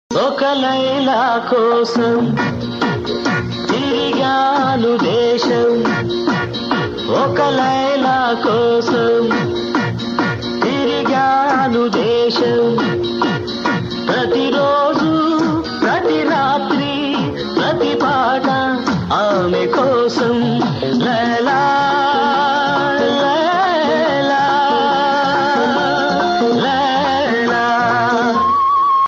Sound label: old song ringtone telugu; old movie songs